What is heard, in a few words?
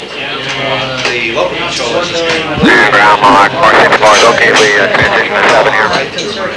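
air traffic; Transportation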